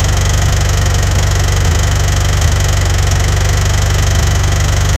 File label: v8 engine hemi